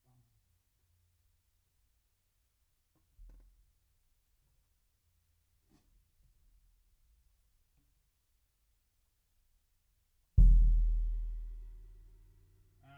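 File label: Bass Drum Out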